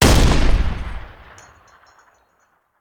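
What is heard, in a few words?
Artillery Cannon Anti Aircraft; Sound Ideas; Guns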